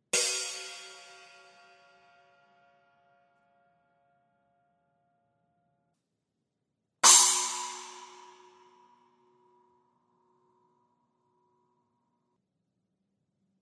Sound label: Pre Configured Stack; cymbal